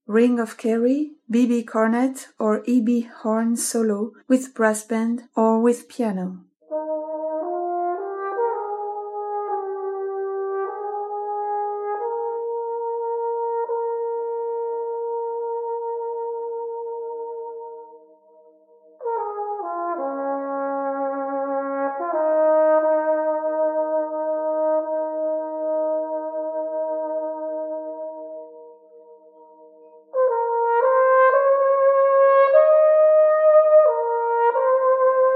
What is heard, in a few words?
alto solo